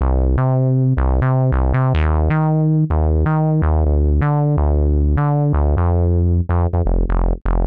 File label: 125 Res Synth Bass C; PowerFX - Nu Jazz House